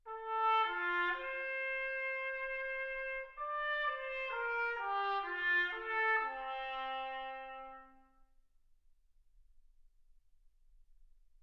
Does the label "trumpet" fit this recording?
Yes